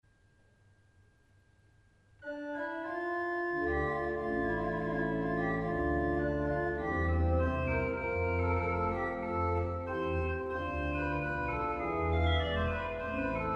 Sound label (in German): Sesquialtera